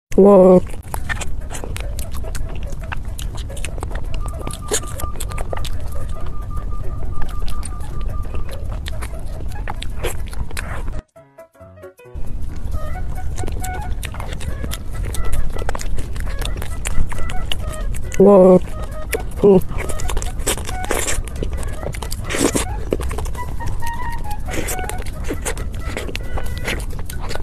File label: Eating cream filled cake